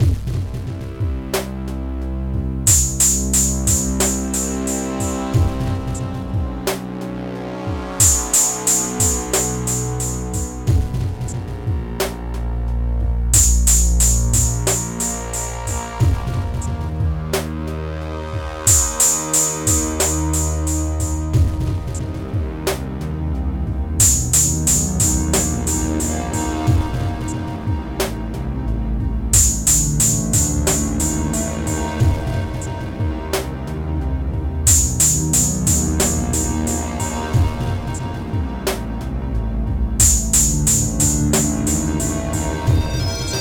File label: DS-10 Spooky Forest; LPChip